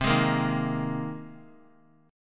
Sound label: C Minor 7th Sharp 5th C; Cm7#5 strummed; JGuitar